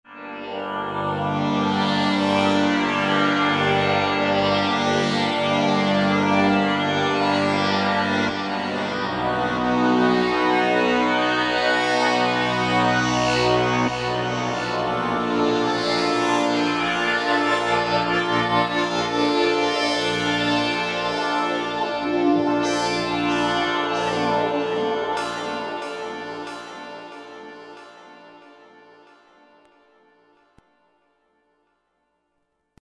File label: Roland V-Synth; BriteVektars; Factory Patches